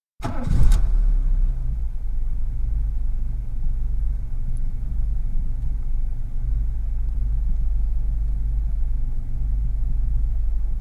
Vauxhall Carlton, interior, switch on, idle, switch off (18 litre saloon, 1986 model); Car Sound Effects; BBC